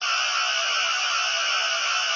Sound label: Phaser; Breen